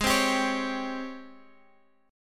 G sharp Major 11th, Guitar, Drop D; 18,15,17,18,x,x

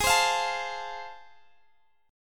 A Minor Major 7th Sharp 5th G sharp

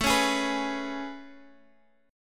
A Major 9th A, Guitar, Standard; AM9; strummed; JGuitar

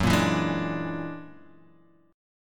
Gb Augmented 9th, Guitar Half Step Down

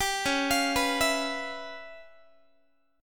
C sharp 7th Sharp 9th Flat 5th C sharp Guitar; arpeggiated; 4A,4E,5A,5D,5G#,6C#; C#7#9b5; JGuitar

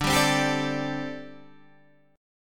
D 7th Suspended 4th, down, Guitar, Half Step Down

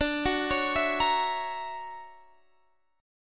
D Augmented Major 9th down Guitar Standard; D+M9 arpeggiated; JGuitar

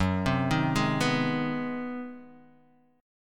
G sharp 7th Sharp 9th

